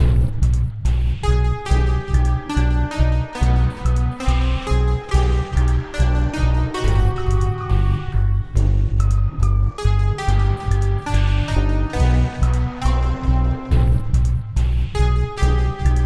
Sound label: A Bit Unreal 140bpm Loop